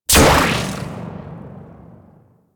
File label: Bow Shot, Weapons; Warframe; Corpus